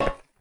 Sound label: metal footstep